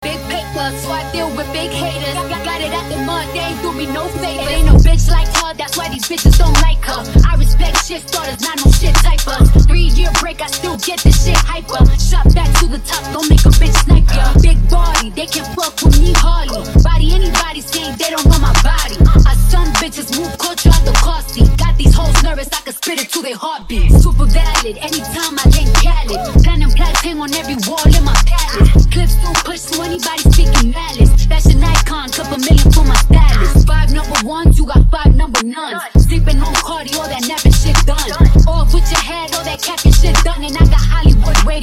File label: Hip Hop Youth